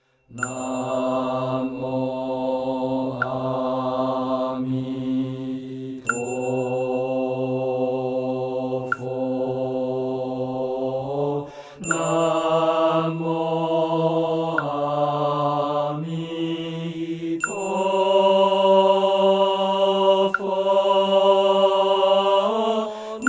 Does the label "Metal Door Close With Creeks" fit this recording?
No